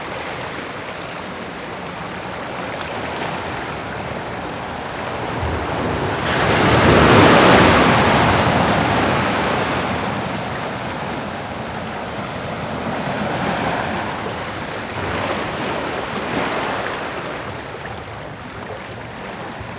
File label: Ocean Waves